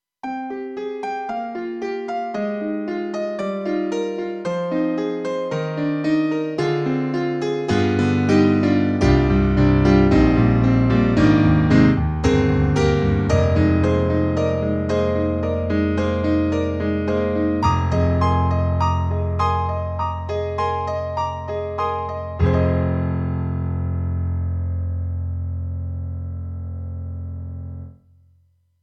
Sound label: grand jazz piano, TRITON Rack; KORG EXB-PCM08